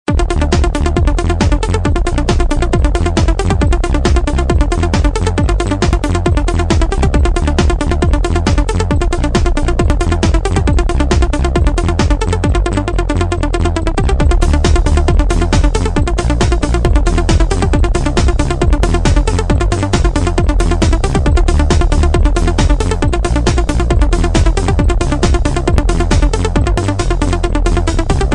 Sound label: techno mix, dance